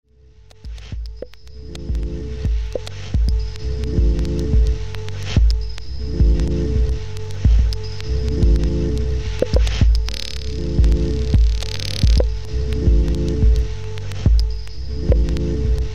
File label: guitar and horns